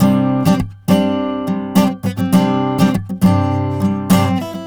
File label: Acoustic Guitar; Guitars